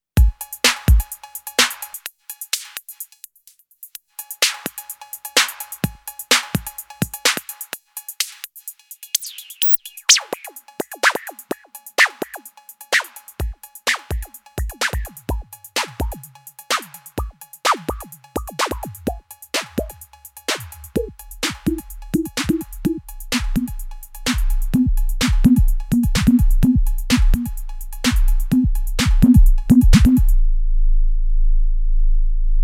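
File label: high pass env follower, Electrix Filter Factory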